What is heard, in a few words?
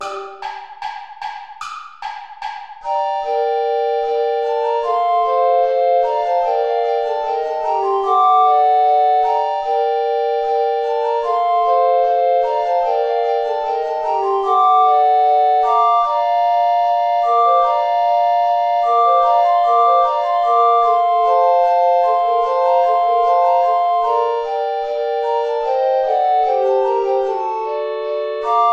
play along without bass recorder; praetorius michael bourra